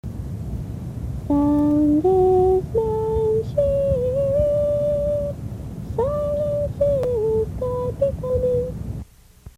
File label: sound is man cheering